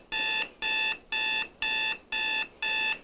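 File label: digital beeps alarm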